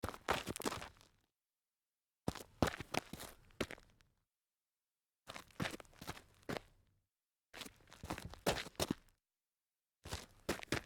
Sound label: footsteps gravel